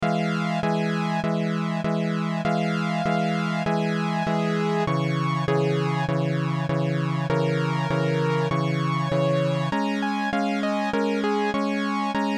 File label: ambient